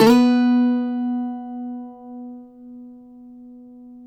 cuatro; Spectrasonics; Hans Zimmer Guitars VOL-2

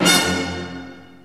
HIT, ORCHESTRA HIT; Crypton - DrumBase5000

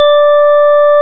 KURZ ORG 1-D, organs; Greytsounds VOL-1

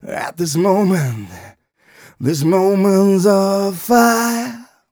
130 mel g, Vocals

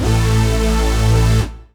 chord A; Techno Trance Essentials; CHORDS

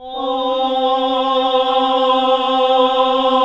AAH C2; Best Service Gigapack 1

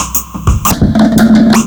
DRUMLOOP, Ragga & Dub Reggae

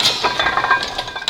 MACHINES

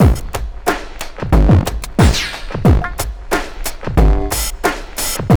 TRIP 90, trip loop

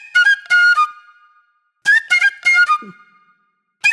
Club Flute; Sonic Foundry (Sony Creative Software); Manhattan Flutes; Super Brown

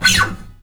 STL SCRAPE, Synclavier, GUITARS; Synclavier Sampler Library

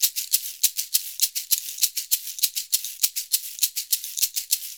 SHK, Shaker; Univers Sons; Basicussions

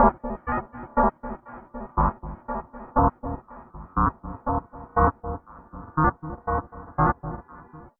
Tape Arp

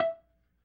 E3 PERC, B3

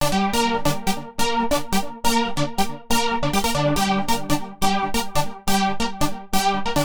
Arp 140bpm G, Trance

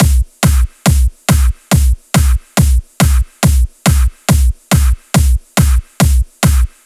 Break Beats, 140 BPM

